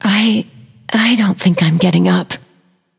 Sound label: death; eset